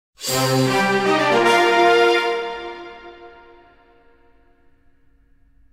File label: Fanfare; CrossCode; Switch PS4 Xbox One PC macOS Xbox Series X Linux